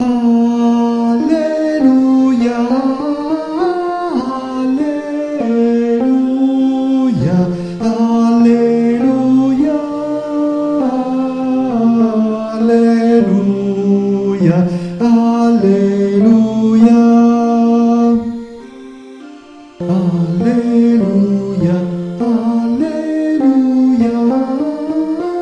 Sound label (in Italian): Alleluia Tenori; Gabrieli